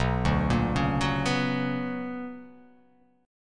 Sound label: B Minor Major 9th